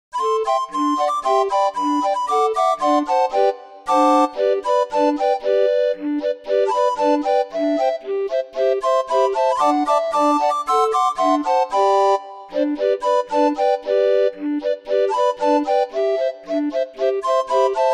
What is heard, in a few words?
Jingle bells, orgue barbarie